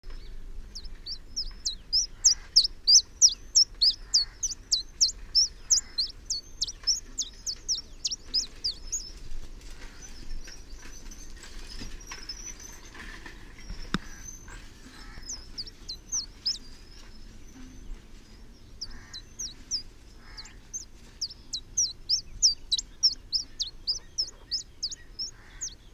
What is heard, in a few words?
song india, tristis